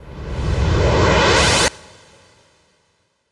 NOIS; XXL 800 Production Effects

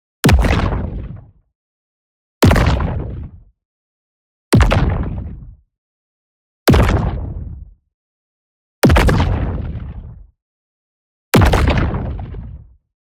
EXPLOSION LARGE Rocky Implosion; boom library; Matter

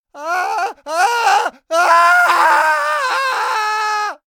npc male scream first; DeadFright

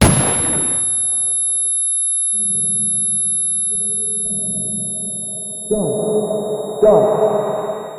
Gun Shot Tinnitus; blindside

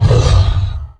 MONSTER Growl Deep Short; MONSTERS & CREATURES; mono; Universal Sound FX

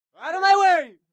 car reaction; Blind Drive

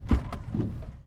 car door open; vehicles; NS Studios - ultra sound collection

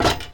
metal land; firefight

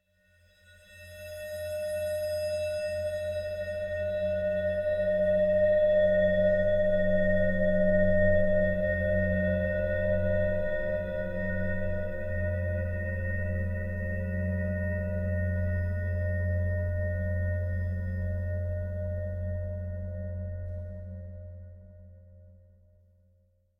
Drone Metallic Harsh Feedback Scary Pad; Hollywood Edge; sound designer's toolkit v2

